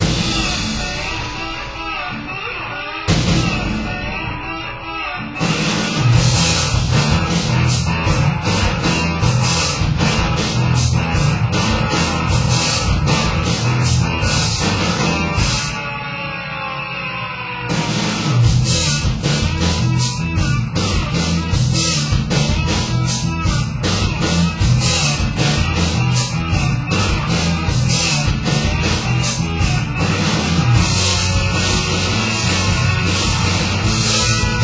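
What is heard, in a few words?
Music Ambient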